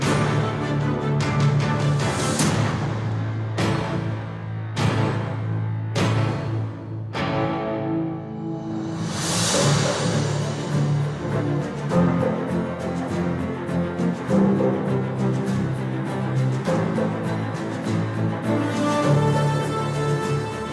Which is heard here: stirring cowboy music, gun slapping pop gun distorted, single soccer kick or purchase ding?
stirring cowboy music